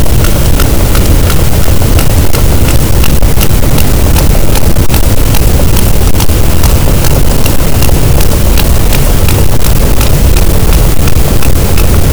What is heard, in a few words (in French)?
cachalot, Physeter Macrocephalus, gaussian noise